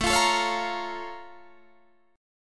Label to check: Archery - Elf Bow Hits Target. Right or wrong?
Wrong